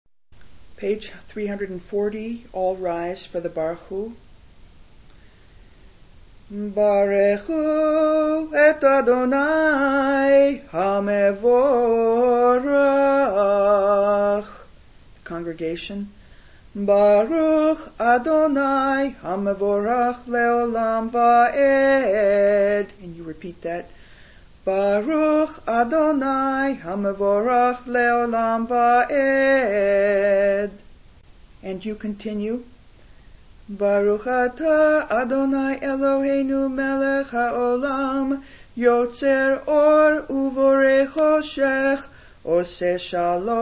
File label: Barchu